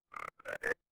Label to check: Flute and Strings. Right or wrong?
Wrong